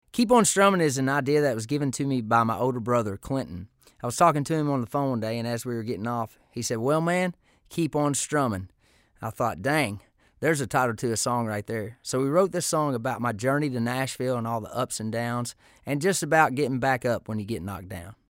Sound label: Keep On Strumming